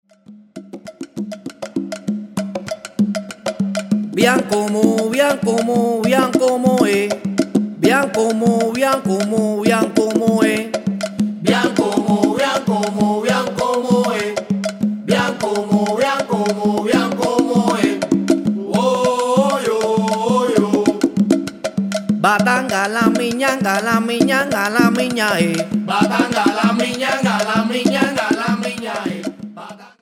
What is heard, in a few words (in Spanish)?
Rumba Rezo Abakua